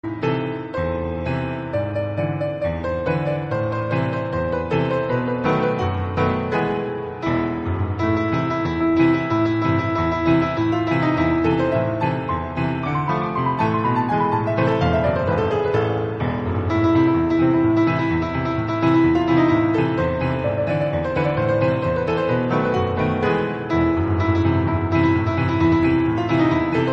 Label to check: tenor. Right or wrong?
Wrong